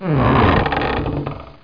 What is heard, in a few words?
door creak; Grounch